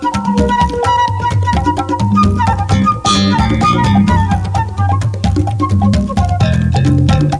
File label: rhyth, loops; endorfun